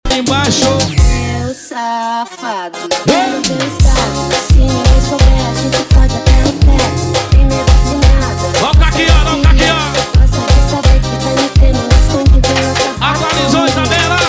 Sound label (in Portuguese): Forro Piseiro